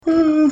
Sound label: sigh